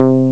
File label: clean bass; Amiga Soundtracker Sample Packs